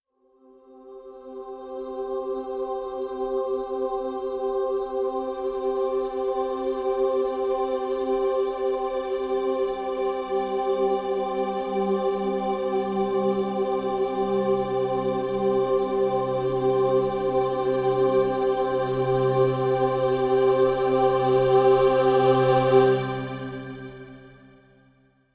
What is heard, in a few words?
String (C)